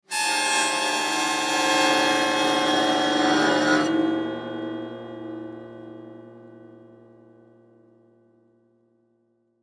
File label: Cartoon Autoharp Bow Scrape String Obnoxious Sound; Musical; Blastwave